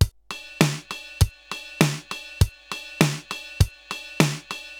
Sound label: RX17 (100BPM), cyborg beats; musicradar